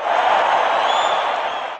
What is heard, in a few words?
crowd winning; BALLY